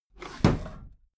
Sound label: desk drawer close heavy